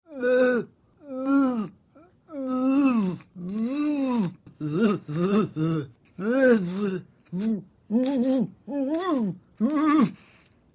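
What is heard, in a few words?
crazy person; sumasshed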